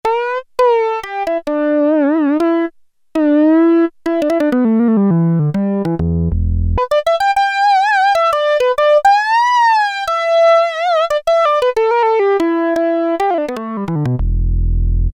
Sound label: Fat Solo In Sync; Sequential Circuits Prophet-5 (Ver.3); Factory Patch; File 2 Programs